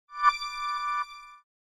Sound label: Friend online tone; Nokia; 6720 classic